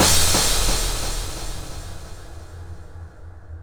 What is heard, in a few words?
Crash Accent; Dance; SFX